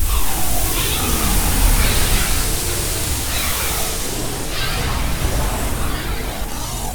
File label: idle breath; Quake 4 Alpha; id software; strogg; valkaryne